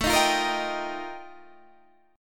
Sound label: F 11th A, chord